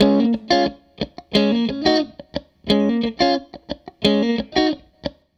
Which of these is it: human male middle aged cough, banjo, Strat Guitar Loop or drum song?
Strat Guitar Loop